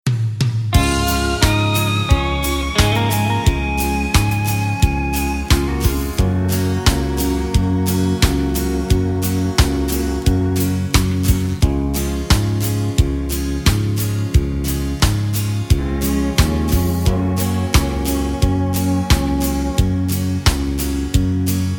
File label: (Db) No Backing Vocals